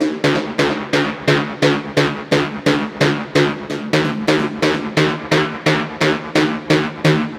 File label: Synth Loop 130 D, Techno; Loops; Synths; GhostHack; Ghosthack - Free Techno Sounds 2024